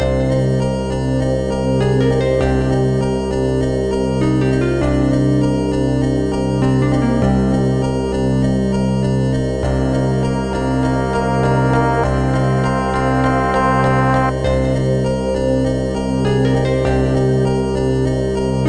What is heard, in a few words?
WHARF; Brandish 2 The Planet Buster; IBM PC